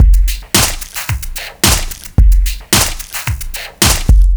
Sick Snare; Ударные и барабаны (RnB Soul); 110 BPM; Drums; ритм-н-блюз

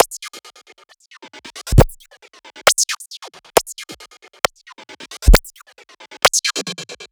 Fragile Kit, 135 bpm

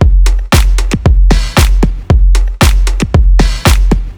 More Pop, electro pop

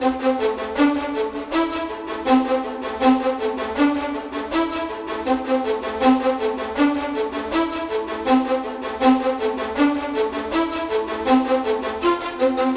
violin; скрипка

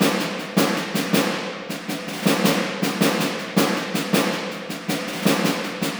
Snare; Сэмплы ударных (Звуки для фильмов); Movie Score; 80BPM; Drums; film music; Барабаны

